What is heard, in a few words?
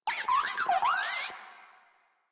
Moa Compassionate Vocal Reward Praise; Warframe; Pets